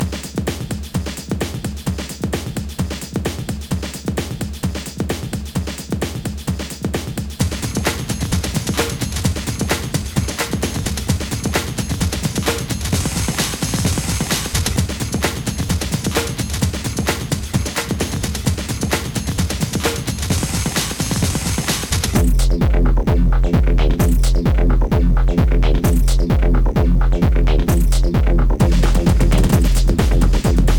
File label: breakbeat song; MED